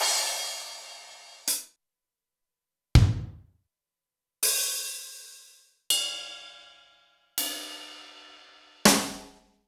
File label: Zoom MRT-3B Kit; Sliced